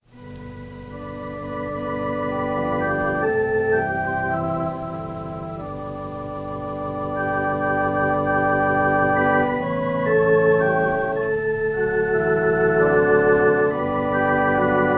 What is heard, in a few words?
Organ prelude